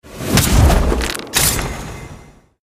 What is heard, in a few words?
Buy Weapon